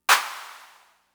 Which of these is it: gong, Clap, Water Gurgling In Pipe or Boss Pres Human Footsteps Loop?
Clap